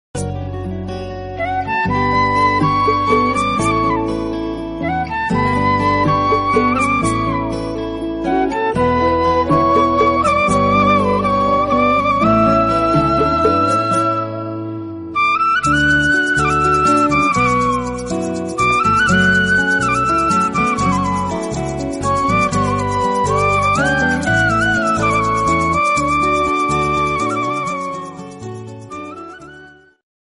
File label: Instrumental